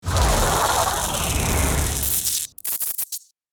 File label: Electricity whoosh transition; cinematic